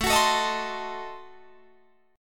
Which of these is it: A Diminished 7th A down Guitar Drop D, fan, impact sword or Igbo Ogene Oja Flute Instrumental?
A Diminished 7th A down Guitar Drop D